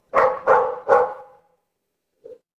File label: animal dog bark